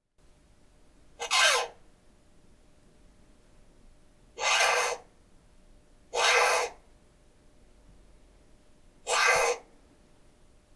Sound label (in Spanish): condor; cantos